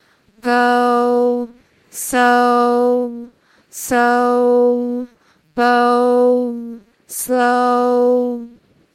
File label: singing